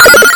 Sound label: Logged In